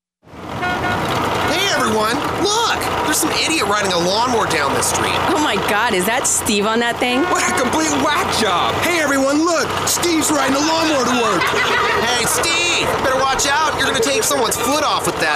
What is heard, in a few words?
lawnmower boy